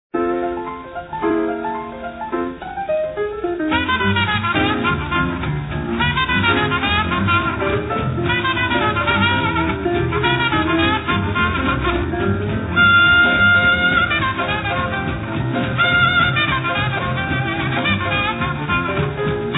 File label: Jazz; In Store Music